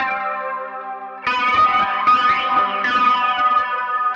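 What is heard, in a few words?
Guitar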